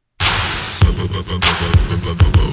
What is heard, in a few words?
Photo Shutter